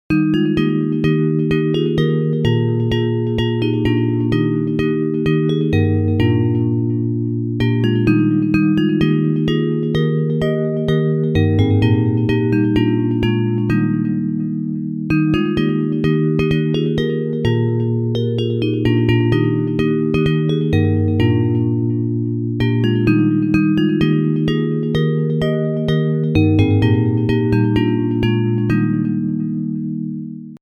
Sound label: Bells, Traditional hymn